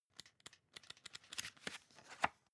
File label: magazine browse